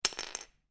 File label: Lego Single Lego Piece Drop On Floor; Miscellaneous Toy Sound Effects